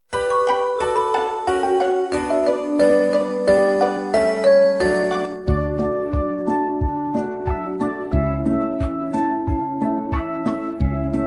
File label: instrumental